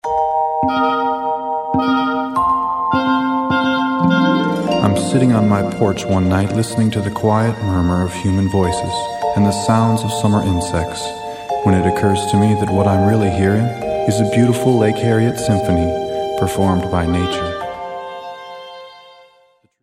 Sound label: Chorale